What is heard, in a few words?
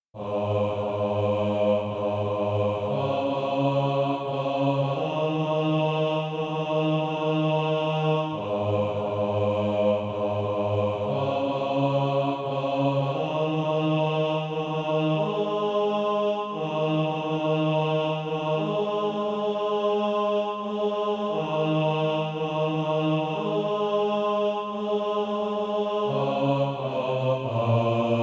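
bass, Practice Music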